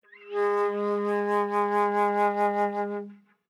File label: Alto Flute vib ff Ab3 stereo; Woodwinds